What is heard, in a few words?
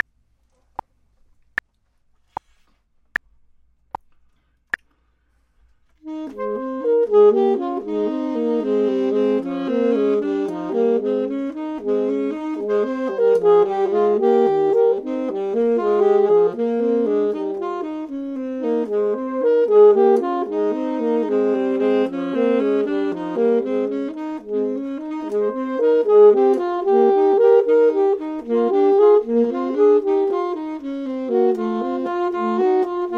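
both, Saxophone and Clarinet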